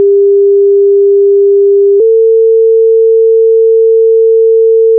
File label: fire